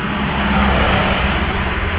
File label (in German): Sample Verkehr